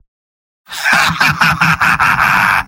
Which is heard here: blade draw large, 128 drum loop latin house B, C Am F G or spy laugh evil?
spy laugh evil